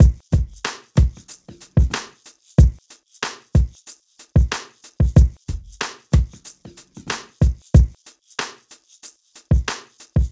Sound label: Drums, Hip Hop